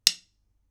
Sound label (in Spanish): Baqueta ancho, beats with different tools and materials